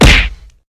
Punch

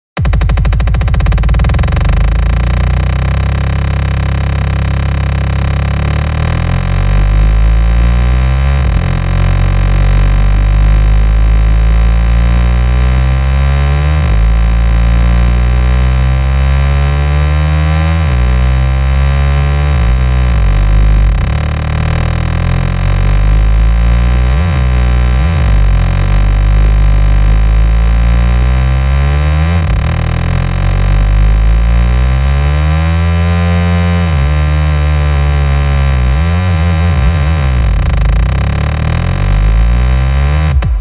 FL Stock Kick

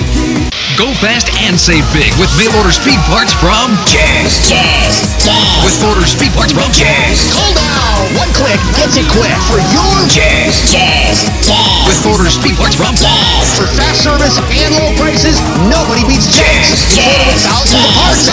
Eurobeat along with an ad about car parts

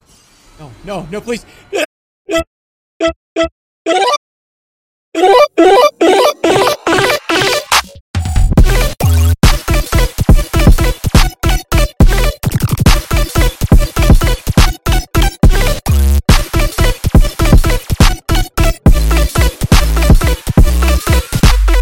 dubstep

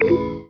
friend join; hacked cs; Friends

